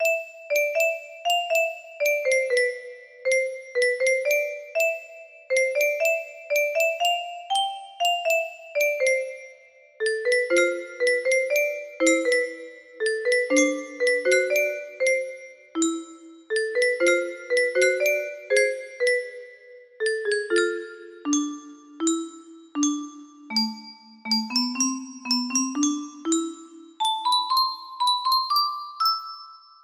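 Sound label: piano solo, music box melody; corpse bride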